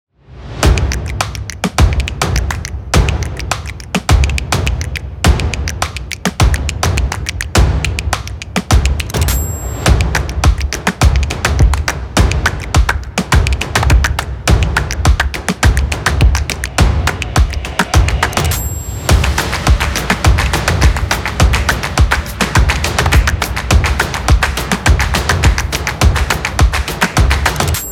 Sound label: percussion full version